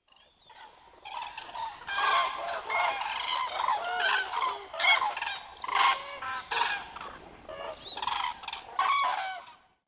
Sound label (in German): Kranich Einflug